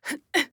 Mage Grunt Jump; Realm Royale; Wandering Alchemist

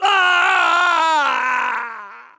Falling; Engineer; Paladins

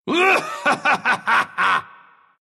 laugh, ru; dota2

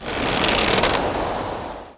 Bow Powershot cast; Sparrowhawk

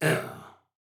Grunt Slow; Realm Royale; Warrior; Alpha1

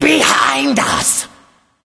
Laughing Skull; Smite; Bakasura

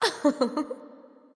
Aphrodite Laugh

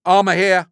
Armor; Assassin; Sly Scoundrel; Realm Royale

